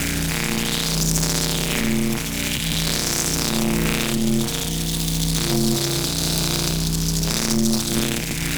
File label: Forcefield Loop; Gameplay; Warframe; Zariman